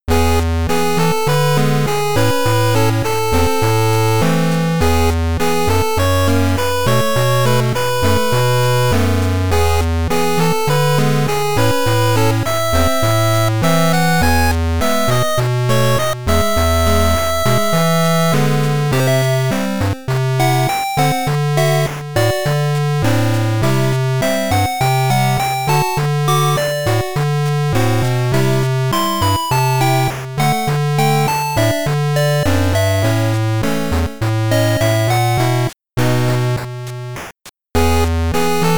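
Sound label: 8bit Peaceful Animal Village, レトロゲーム風の平和でほのぼのした曲です。 ボサノバ系; 【8bit フリーBGM】どうぶつの森っぽいほのぼの明るい曲; 「どうぶつの森」をイメージして作りました。ボサノバ系なので、汎用性は高いと思います。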